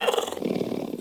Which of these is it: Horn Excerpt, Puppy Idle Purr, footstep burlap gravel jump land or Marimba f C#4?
Puppy Idle Purr